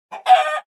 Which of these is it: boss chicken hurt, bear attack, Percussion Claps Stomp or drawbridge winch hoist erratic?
boss chicken hurt